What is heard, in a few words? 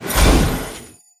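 pterodactyl boss buf; pterodaktilj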